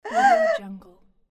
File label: female surprised